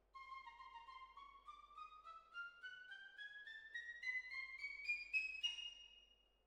Hall, Jazz for piano

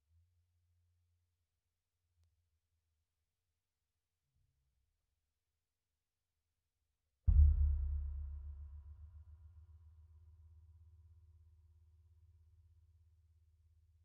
Sub

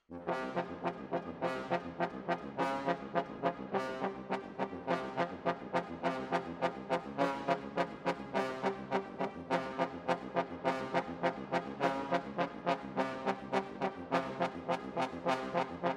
Trombones, jazz for piano

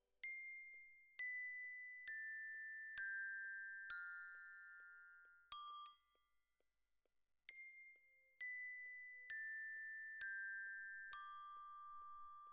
Glock Close

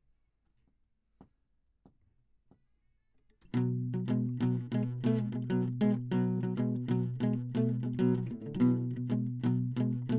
Classic Guitar

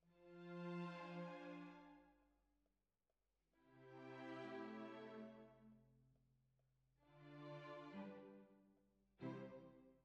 Bassoon, Jazz for piano

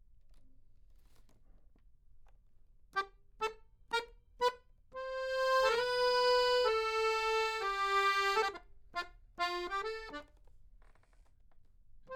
Accordion; Jazz

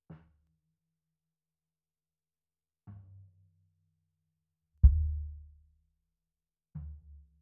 Sub

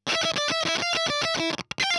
EL Fuzz End

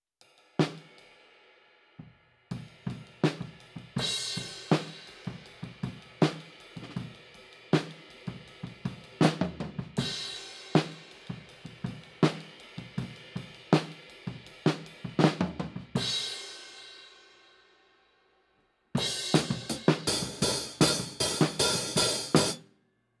HiHat, 160 BPM

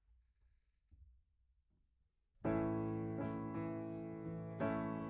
Piano A

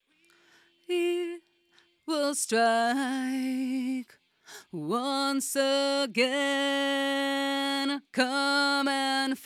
Vocal

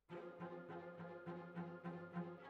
Cellos

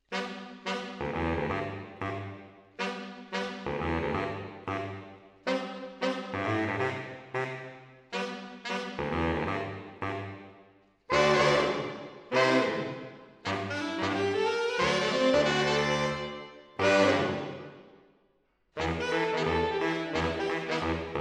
MKH AB, piano

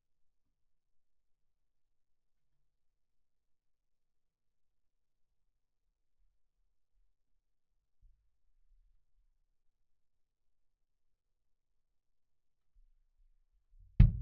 Kick In, 160 BPM

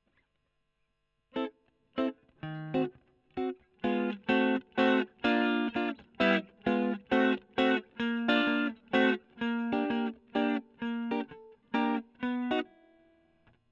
E.GTR Gefell

